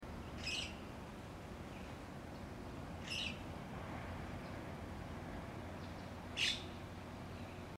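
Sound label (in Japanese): muku, 野鳥の声; ムクドリ; 群れをなすことが多く; 群れをなすことが多く、市街地で問題になっていることもありますね。ハトやスズメと並んで、どこでも目にできる野鳥の一つです。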